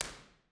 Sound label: Hall Huddersfield, Real Places; Phipps; Ableton; Convolution Reverb